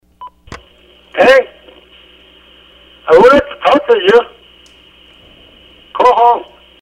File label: machine